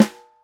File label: damped snare g sharp key, steel snare drum tuned to the G# note. Loudest frequency: 716Hz; snare drum samples; one shots